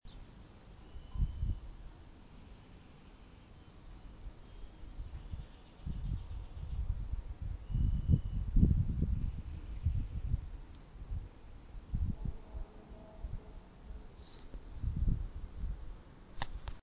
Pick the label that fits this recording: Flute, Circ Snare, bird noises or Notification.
bird noises